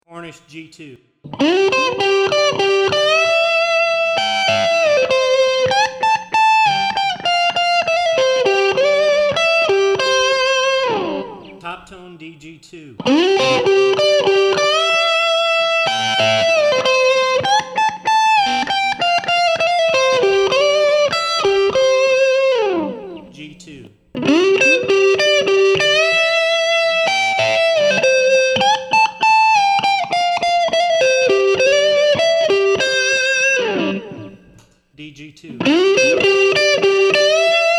G2 vs DG2 pos2 and neck pickup

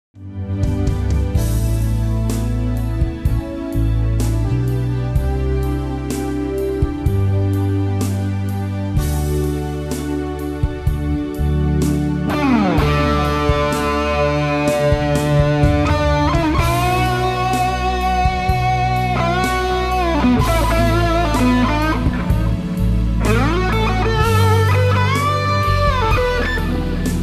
Bass Boost; Nova470

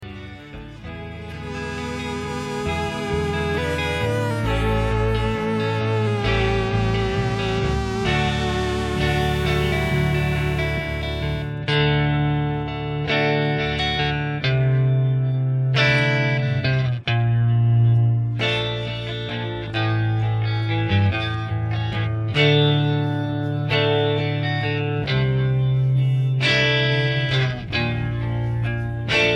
Isolated Clean Guitar

